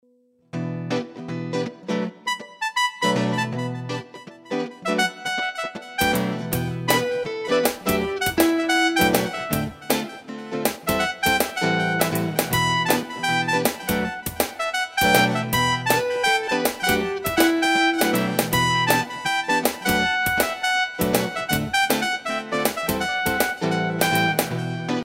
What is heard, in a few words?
Dance, ringtones; Okapia; Spark